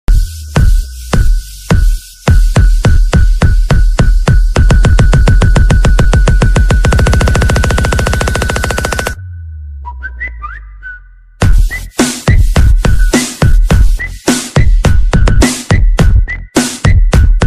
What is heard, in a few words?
hindi ringtone